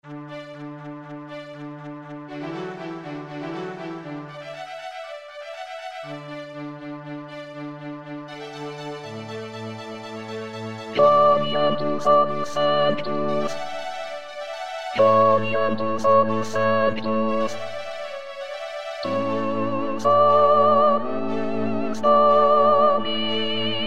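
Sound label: Quoniam Soprano; vivaldi gloria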